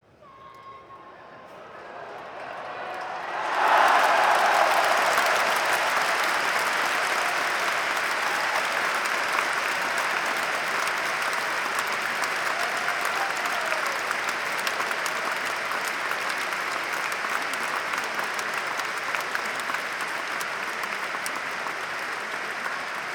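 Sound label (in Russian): applause with shouts 10000 people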